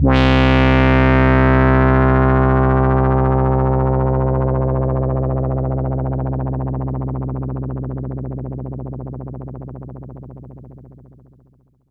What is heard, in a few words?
pad C2, Moog Minimoog; Pads